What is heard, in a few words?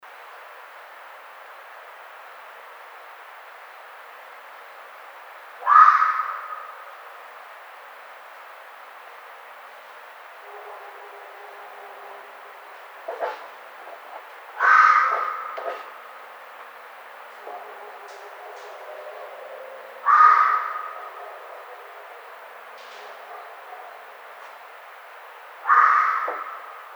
screams